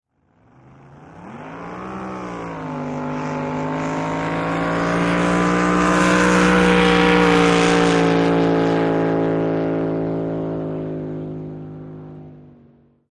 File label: Drag Boat Start by